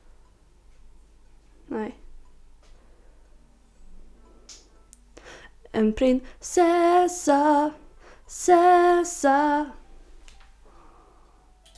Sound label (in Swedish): sang